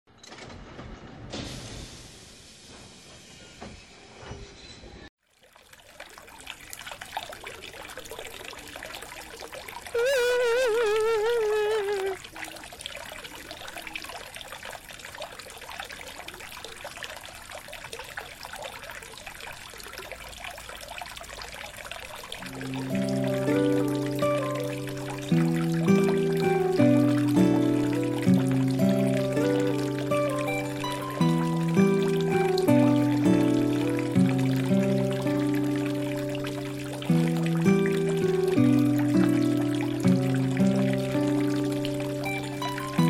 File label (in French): SANS VOIX